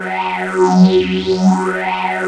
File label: 3100 G 3, Korg; Back In Time Records; Korg Universe VOL-1